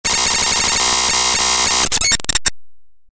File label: code digital hard